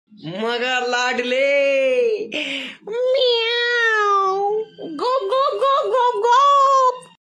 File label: meoww ghop ghop ghop; animals